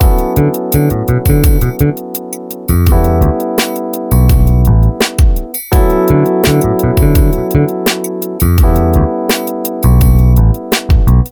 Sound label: Keyboard